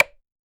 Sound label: short wooden click, medieval